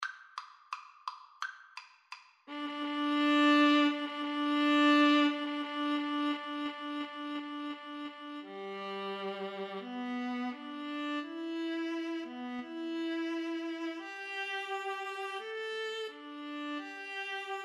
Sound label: viola viola